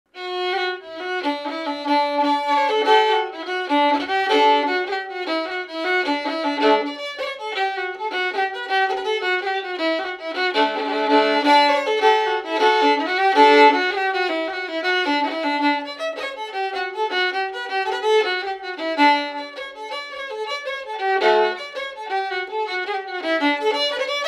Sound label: jig